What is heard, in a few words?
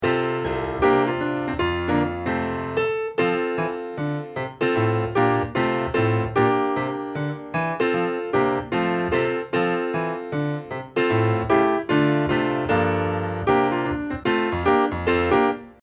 accomp; good news chariots a-comin